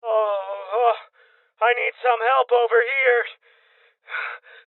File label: Injury Male Young; rebel cops 2019 gamerip